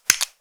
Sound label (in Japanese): ぱちゅ音 単発 激しめ